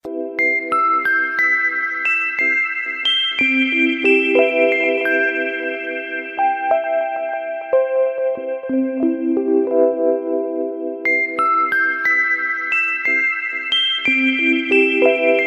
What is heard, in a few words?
nice sms tone ringtone